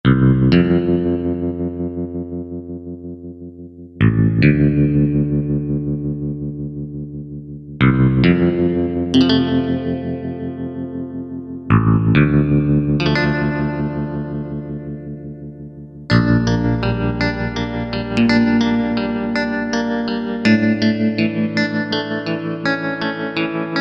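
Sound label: Guitar, Yamaha TG500; Internal Voice; GT Amod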